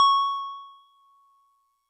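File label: DigiPianet DX100 C sharp 7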